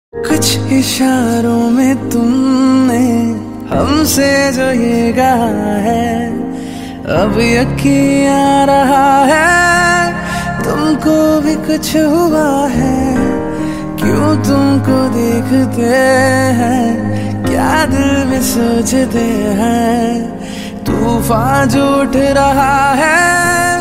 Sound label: romantic punjabi ringtone